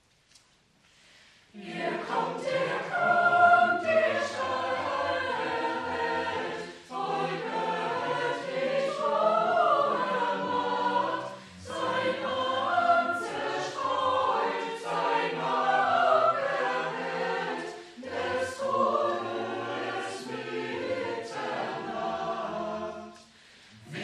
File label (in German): Chor